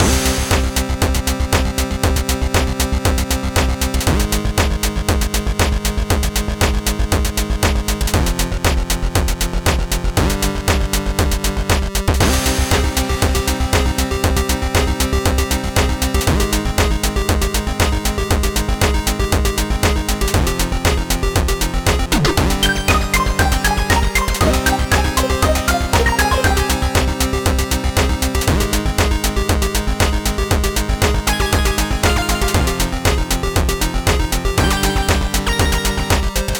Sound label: Medium loop, 118